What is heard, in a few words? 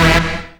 SYN, Jungle; E-Lab; XTC Files of Jungle